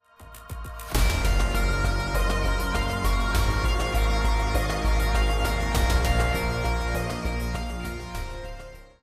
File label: Preview Stinger, Surround; apex legends season 12; Horizon